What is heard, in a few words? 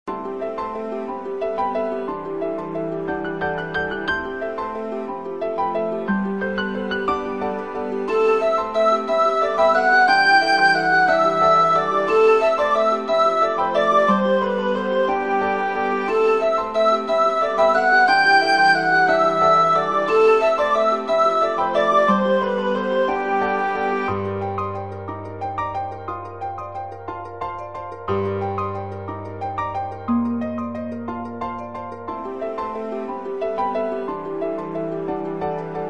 Music Gameplay; Castaway Island Tower Defense